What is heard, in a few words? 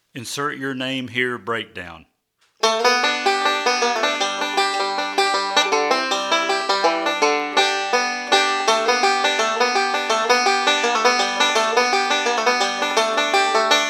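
Breakdown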